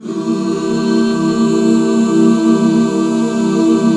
C 7, back chords; Sound & Vision - Gigapack II CD 1

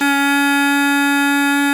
KORT D 3, kortholt; SoundBytes - Early Patches

